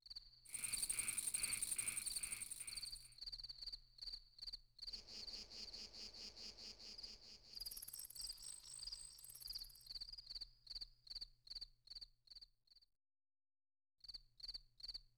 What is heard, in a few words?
Chirping Crickets; gamerip; lunar 1 silver star story; PS1